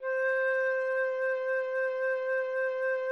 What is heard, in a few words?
flute C5